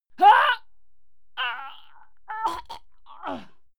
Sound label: female fight die; schreie; menschen